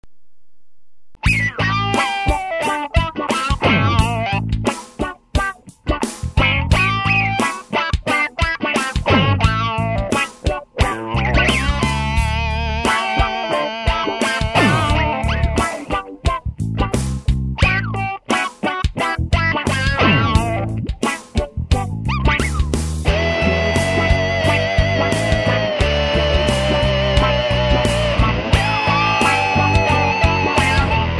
Barking Groove

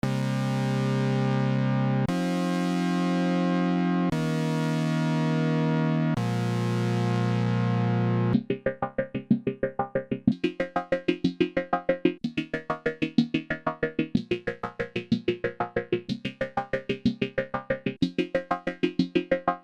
synth

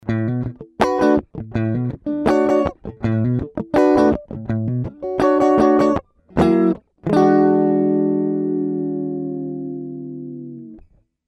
strat 12 stage stereo

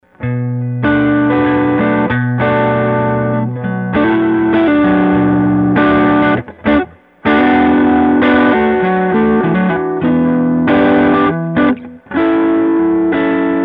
rat prs slightly dirty

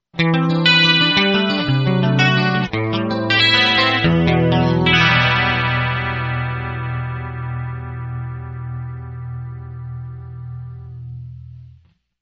clean cutting

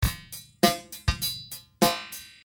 delay drum

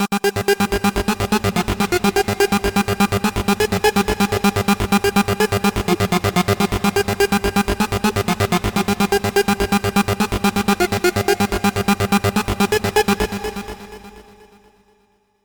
Trance

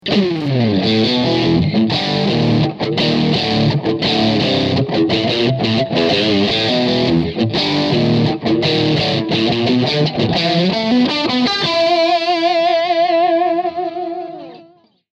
Tube Stack

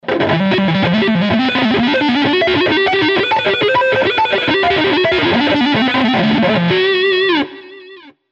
Dark Solo

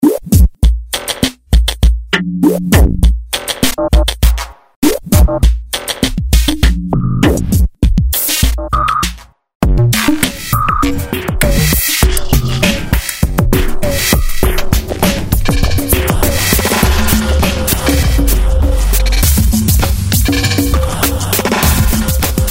binary grooves

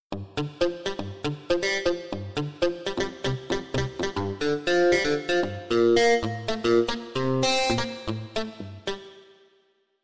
grtch mute pick ampd

